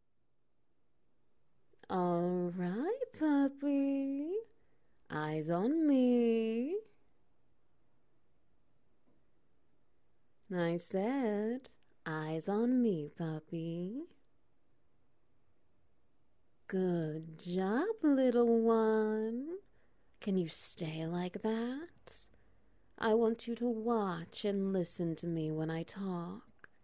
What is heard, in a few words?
Wet Noises; Missed Opp Pup-structions